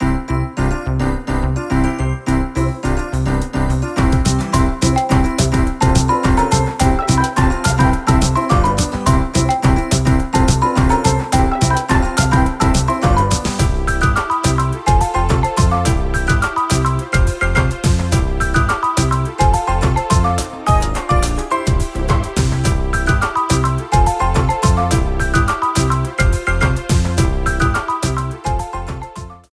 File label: Jump! (Ground Theme)